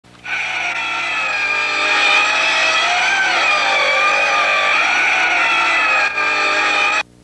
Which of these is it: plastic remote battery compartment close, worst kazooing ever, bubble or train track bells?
worst kazooing ever